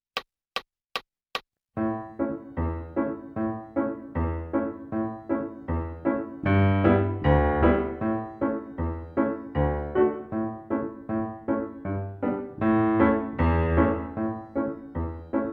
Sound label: piano acc; Old Joe Clark